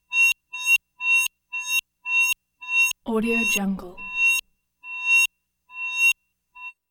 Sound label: synth tone reversed high pitch ringy tones